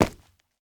Foley Footsteps Rocks Sneaker Jump Land On Two Feet Close